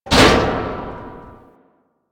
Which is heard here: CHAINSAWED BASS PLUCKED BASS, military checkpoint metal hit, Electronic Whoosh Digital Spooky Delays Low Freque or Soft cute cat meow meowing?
military checkpoint metal hit